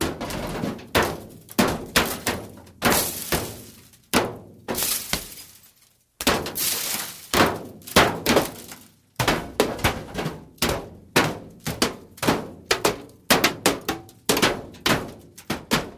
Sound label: Metal Impacts Glass